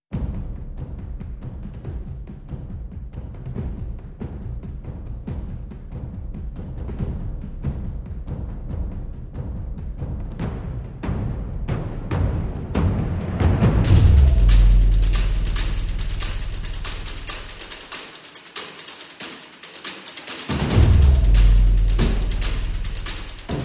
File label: full with small perc